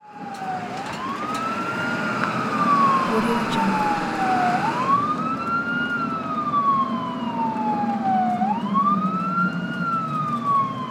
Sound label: ambulance; داخل آمبولانس; urban sounds